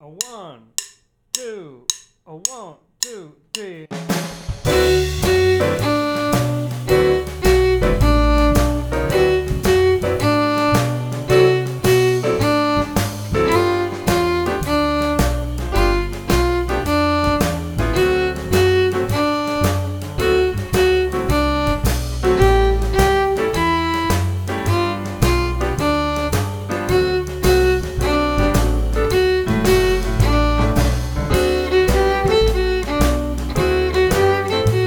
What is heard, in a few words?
Play Along Inner Melody On One Measure Phrases